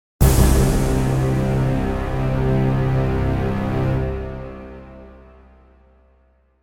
Orchestral Crescendo, Orchestral crescendo chord in c major.; Musical